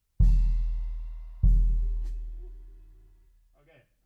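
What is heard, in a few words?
Bass Drum Out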